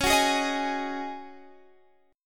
C sharp Suspended 2nd Suspended 4th, C sharp, Guitar, Standard; strummed; JGuitar